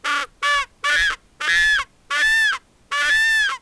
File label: seagull